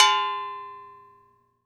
Cowbell, World Percussion; Cymatics; PHARAOH Beta Pack; Pharaoh Premium Drum Samples (BETA)

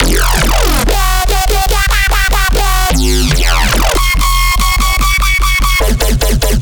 Drop Loop 145 BPM F Min, EDM, Synths; Loops; Cymatics; EDM Starter Pack

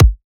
Kick, dubstep; Ghosthack - AC2024 - Day 13 - Dubstep Sounds 2025; Frost Kick

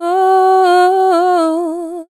"Oooh", Vocals, Chants

